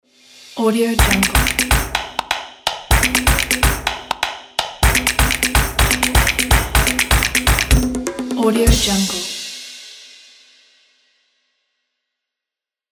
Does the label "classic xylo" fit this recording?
No